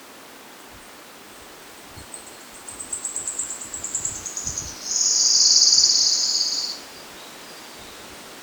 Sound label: wood warbler song